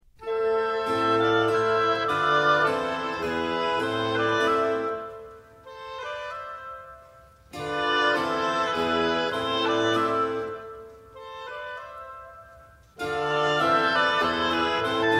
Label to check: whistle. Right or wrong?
Wrong